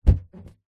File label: balloon inflated catch; foley